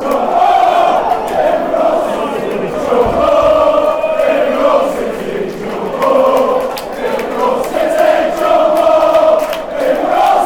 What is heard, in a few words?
jump if you love city